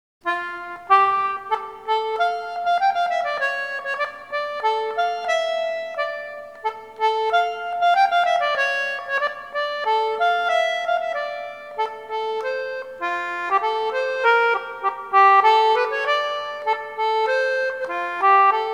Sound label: concertina; My Lady Cullen; Played in the standard treble range (on my tenor-treble); English concertina